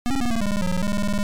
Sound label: Intro Beeper; ZX Soft; Wizard